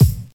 Acoustic Bass Drum One Shot C Key, Royality free bass drum single hit tuned to the C note